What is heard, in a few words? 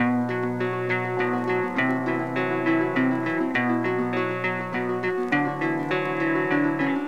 guitar, Loops, Sad